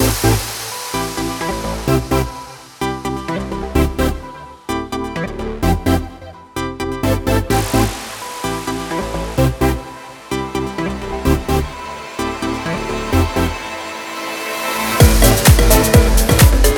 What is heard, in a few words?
discovery pro